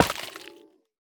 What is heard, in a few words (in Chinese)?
幽匿催发体破坏声